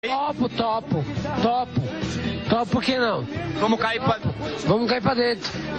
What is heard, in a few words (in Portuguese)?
vinhetas